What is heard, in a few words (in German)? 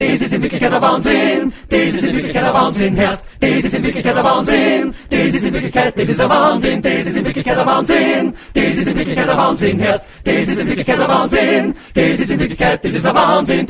wahn chor; 50er